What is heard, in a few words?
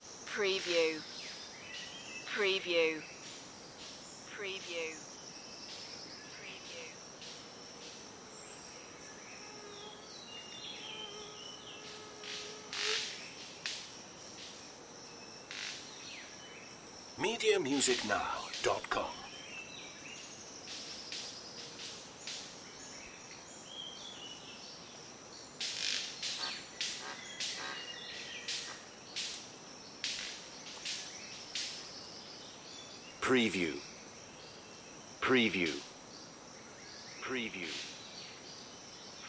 rainforest ambience